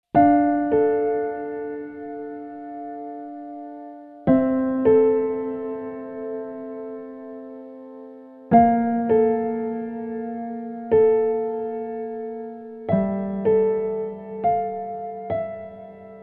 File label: Piano Ultraplate; Mercury X